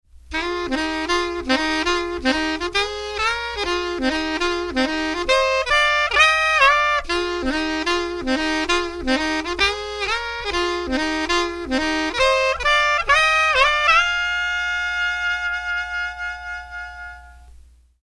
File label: shifter